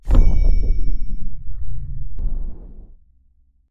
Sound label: slowmo in